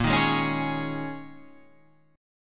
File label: G sharp Suspended 2nd Suspended 4th, guitar chord